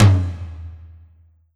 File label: Korg N1R Low Tom